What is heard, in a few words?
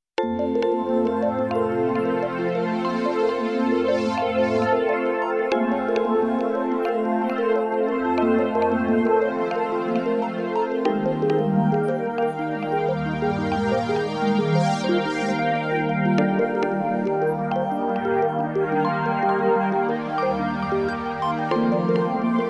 Particles in Space